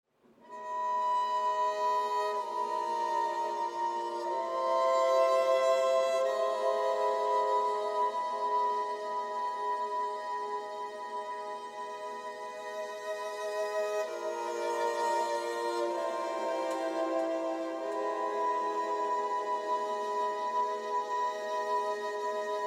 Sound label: Exploration Night String; Horizon Zero Dawn; PS4